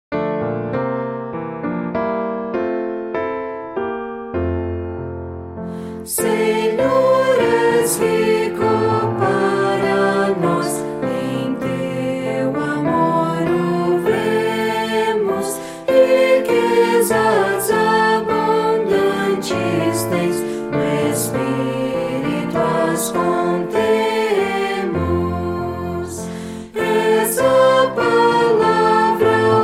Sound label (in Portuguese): voz piano